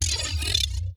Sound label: technosphere moving out; Stellaris; guardians